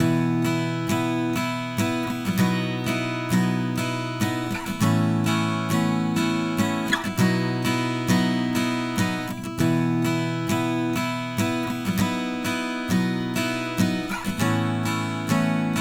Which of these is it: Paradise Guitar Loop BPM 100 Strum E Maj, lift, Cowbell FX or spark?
Paradise Guitar Loop BPM 100 Strum E Maj